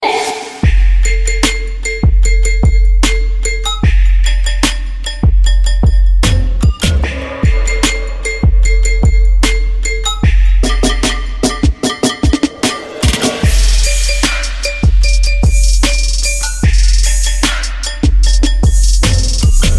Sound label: Trap